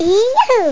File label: yeehaa; CD Action